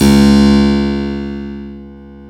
KEY HRPCR, Roland; Roland - LA Composer vol. 1

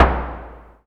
FX DRM, Analog; Sound & Vision - Gigapack II

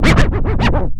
ST SCRCH, SCRATCH; AKAI S-Series CD-ROM Sound Library VOL-4

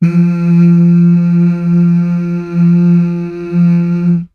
MMHH

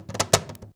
CAJ FILL, cajon fill; Univers Sons - Raricussions

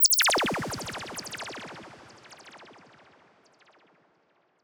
Blaster Shots, transition samples

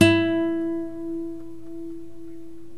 NYLON GUITAR

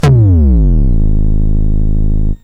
Electron Sidstation, Drum Machines

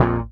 SHRT A#, Bass; Sampleheads; Will Lee Bass Library

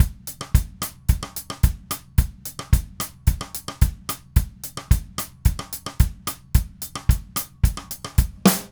Red Maple Groove 110, DW Red Maple Kit

